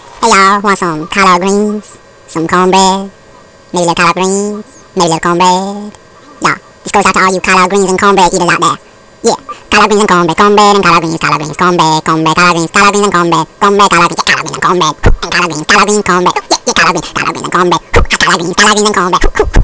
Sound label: collared song